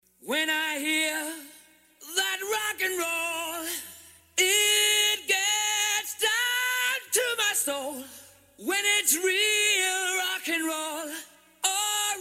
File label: rock roll tone ringtone